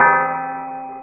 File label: Metal; Retro Synths; Vector; Components; Vector Attacks